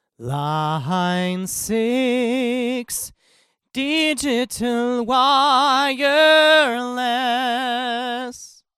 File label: e835 real; line 6 xd-v70